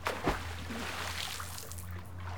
Pool Splashes; E-MU Producer Series Vol. 3 – Hollywood Sound Effects; Water

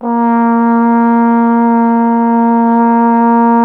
Trombone A#; Miroslav Vitous - Solo Instruments VOL-1

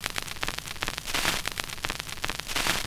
Crackle Loop, Vinyl Noise

